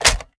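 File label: assault rifle reload fast mag in; gmod; viper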